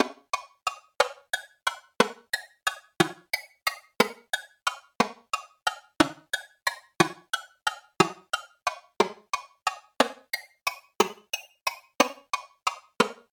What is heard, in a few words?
woodblock